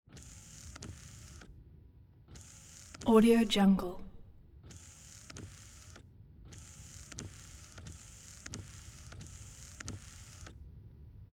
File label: 2008 jaguar xkr car windshield wiper; prosoundfx